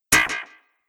Robotic Twang Sound, sci-fi robot sound effect; Robot Sound Effects

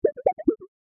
Strange Wonky Warbles; Miscellaneous Cartoon Sound Effects

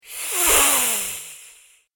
Vampire Hiss; horror; Andrew Fleming

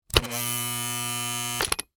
Device On Off Hum, Scifi; Science, Fiction; Tech; BlastWave

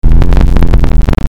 Ascend Static Bass Crackle; Production Element Imaging Element Accent Transition; Ascending

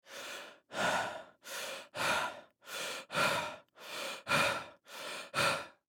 Human Male Breath Heavy; Breathing

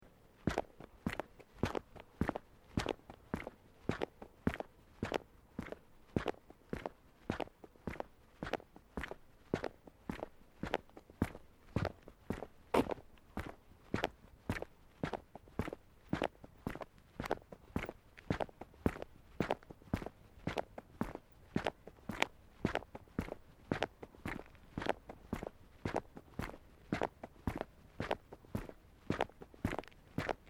Footsteps boots road sequence; Footstep Foley; Footsteps (Sound Effects:Human)

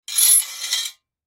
Metal Object Scrape, Metal Object Scrape Squeak; Blastwave; Foley

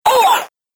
Robot Droid Death, Robot Sound Effects

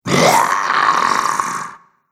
Zombie - Scary Pants; Zomby Sound Effects; horror

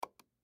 Mouse Click Hard, Apple; Computer; Technology; Computers; Electronics; Blastwave

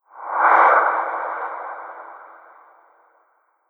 Swoosh Whoosh; Whoosh Sound Effects; Swish, Zoom, Swipe, Whoosh, Swoop, Transition; Andrew Fleming

